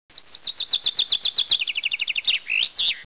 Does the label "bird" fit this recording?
Yes